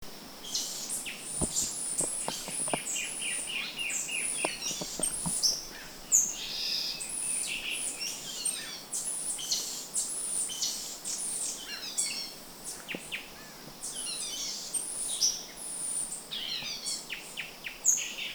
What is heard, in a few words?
Euphonia violacea